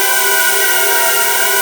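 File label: G4 voice; Northstar - The Wizard VOL-1; VOXTAGON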